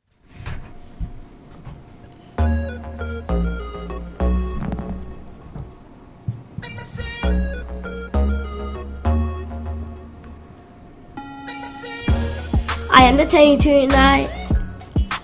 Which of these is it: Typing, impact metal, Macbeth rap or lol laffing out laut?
Macbeth rap